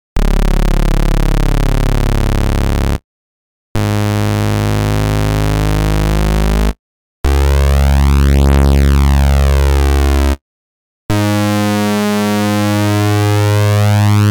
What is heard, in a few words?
MOD BASS Arturia Modular V3